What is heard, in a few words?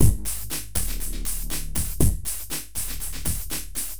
Noise Beat; Drums & Drones